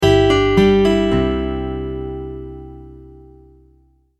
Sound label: multimedia logo, Musical Identity/Signature; Short Musical Signature / Ident; Sonic Logos; Sound Design; McKinney Sound